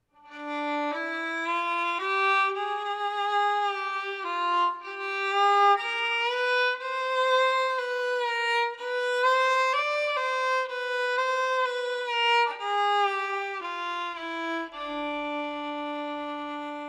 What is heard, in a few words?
Moon music violin